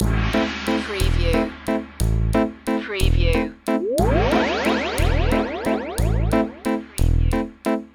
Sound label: carnival of magic